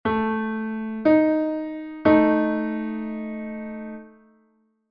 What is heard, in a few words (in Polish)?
tryton a0 dis1; konsonans i dysonans